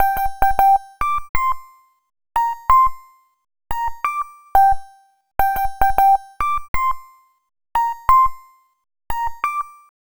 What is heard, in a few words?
Ping Delay; Sonic Foundry (Sony Creative Software) - Club Joints and Street Anthems; Time Traveller